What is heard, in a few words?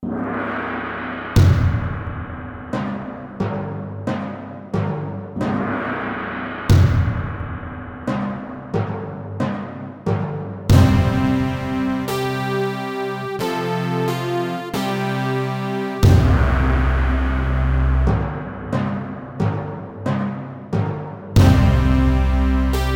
Synthonic Fanfare